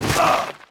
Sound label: FALL, Rider